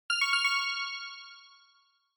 Quiz Ding Dong Fast Short

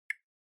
Smartphone Typing Single

